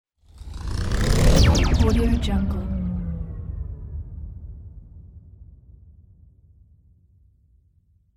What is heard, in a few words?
radio imaging stinger